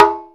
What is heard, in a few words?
Conga Rim Low, E-MU Proteus-2000